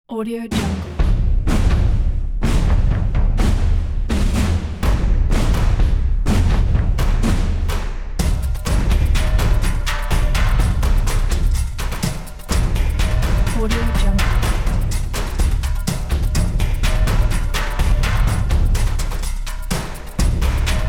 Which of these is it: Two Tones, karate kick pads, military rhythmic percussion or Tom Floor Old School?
military rhythmic percussion